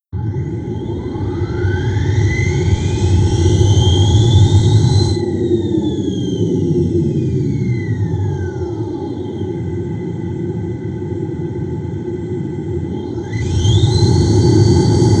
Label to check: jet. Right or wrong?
Right